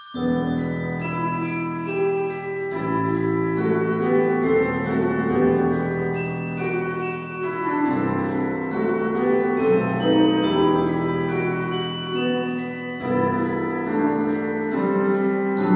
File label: Waterfall